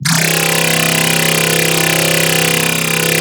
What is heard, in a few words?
ModernT, Noise; Cymatics; Shapeshift